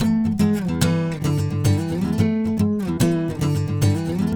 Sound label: Slinky Guitar; Guitars